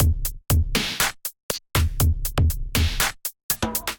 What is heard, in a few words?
Resurrection Kit, Drums, Hybrid; Ableton; Factory Packs; Drum Essentials